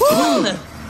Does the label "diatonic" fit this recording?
No